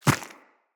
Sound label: Inf Small Walk Default, Footsteps; Infested